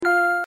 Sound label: Ding; Jeopardy Maker